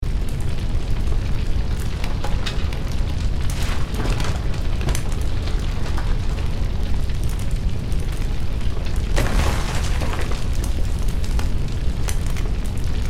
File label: Burning house intense fire raging flames loop